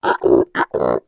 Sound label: giraffe sounds